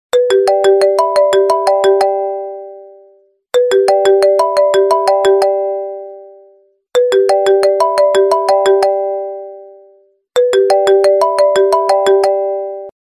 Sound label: apple i phone 4g ringtone